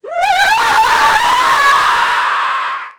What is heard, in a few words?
a mad magician casts a